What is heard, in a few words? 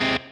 Staccato G#